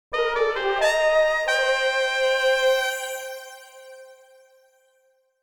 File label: Somber-hopeful logo; WLS